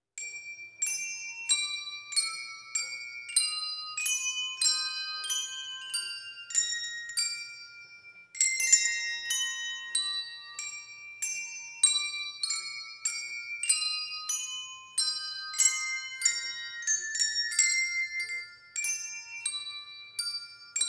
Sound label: Children's Bell Choir; O Little Town of Bethlehem